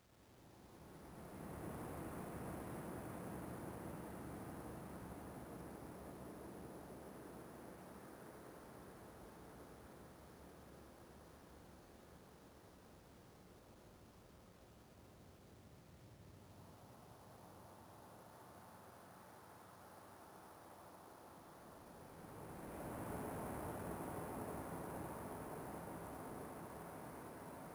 ambience; lastStation; airtone

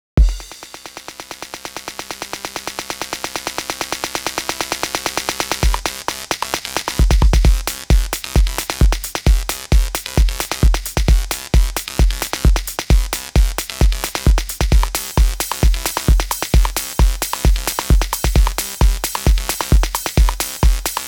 techno kit 132 bpm

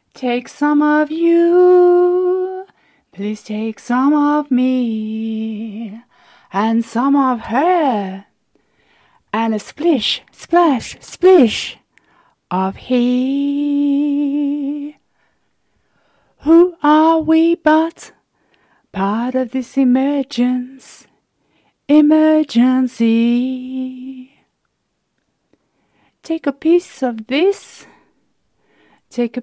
Emergence Sea with MMT (Clean recordings)

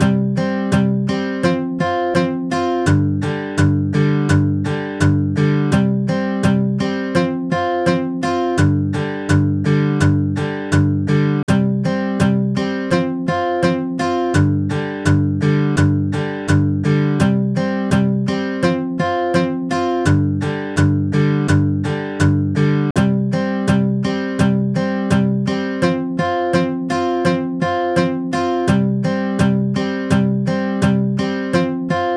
Hanging Eleven Reggae 8

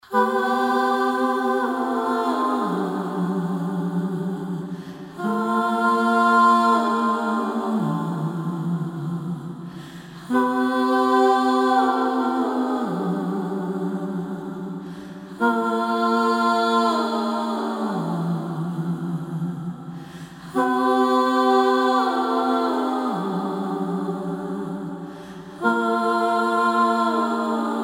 Background Vocals; Indelible Choices

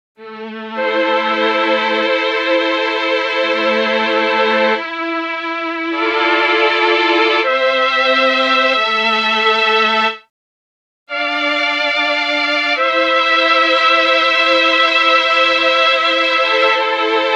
Am90 Chiaroscuro Chords

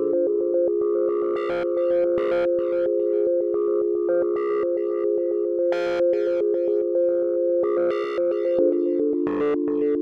synth

